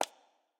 shoe tap; Smite; Baron Samedi

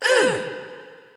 grunt hit; buffed; smite; nike

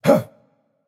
grunt attack; smite; tsukuyomi

